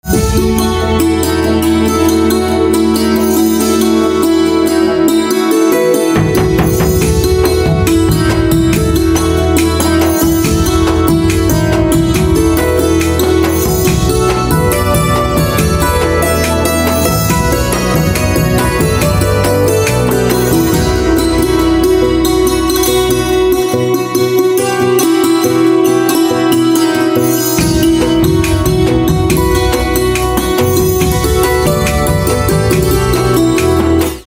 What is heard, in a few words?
Santoor; traditonal devotional instrumental ringtones